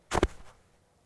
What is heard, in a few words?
footsteps snow